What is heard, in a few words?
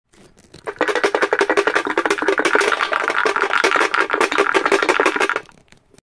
wooden box